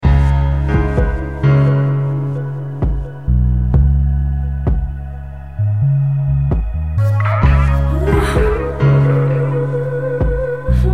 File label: Untitled Instrumental